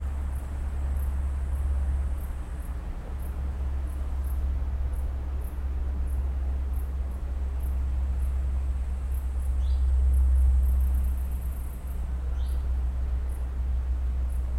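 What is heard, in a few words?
sounds of birds singing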